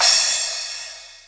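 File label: cymbal splash; Timidity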